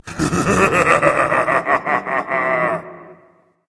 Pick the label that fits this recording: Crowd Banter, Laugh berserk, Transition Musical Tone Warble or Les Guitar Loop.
Laugh berserk